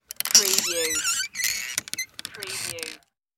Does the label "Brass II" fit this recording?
No